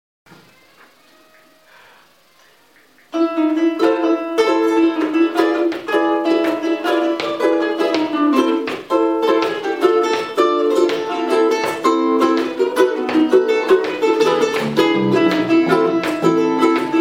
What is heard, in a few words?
ukulele